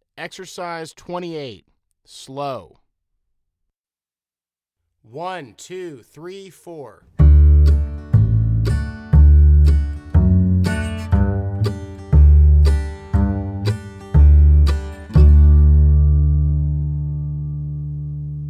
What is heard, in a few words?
Slow